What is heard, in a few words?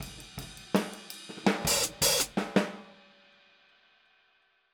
Boogaloo - Outro - Hi-Hat, 82 BPM